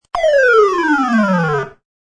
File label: video game; Playing Galaxia video game; Part of Video Games (Sound Effects:Games)